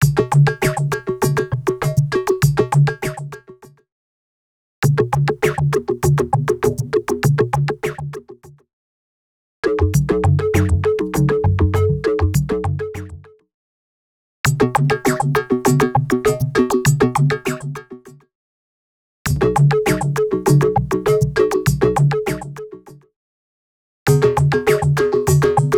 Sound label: African 100bpm; X-Static Goldmine 5